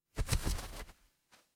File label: paper fabric fabric